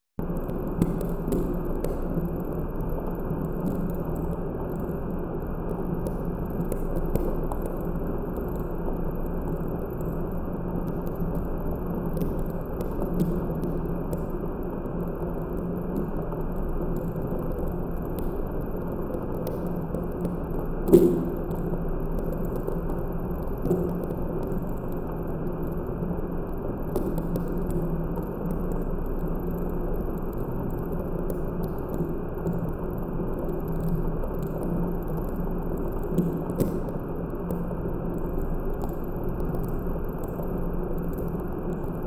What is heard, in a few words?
fractures